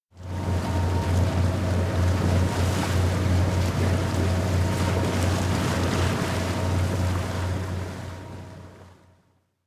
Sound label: boat move; anemoi